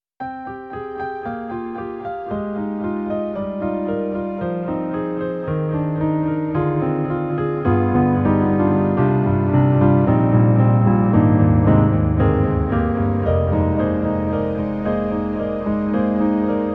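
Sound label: Warm Strings Piano; Roland; SonicCell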